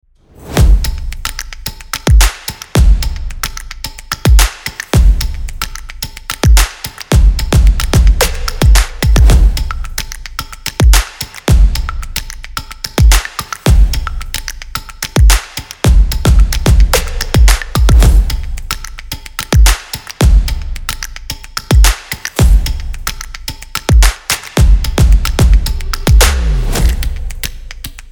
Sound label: Stylish Stomp Opener